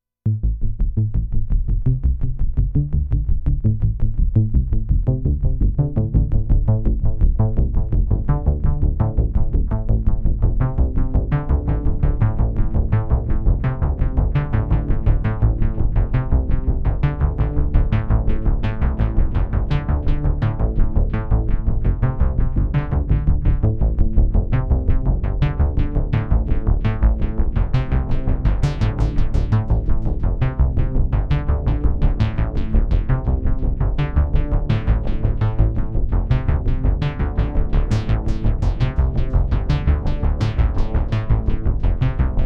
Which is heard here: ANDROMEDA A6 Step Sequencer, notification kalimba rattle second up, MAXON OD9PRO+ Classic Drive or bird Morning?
ANDROMEDA A6 Step Sequencer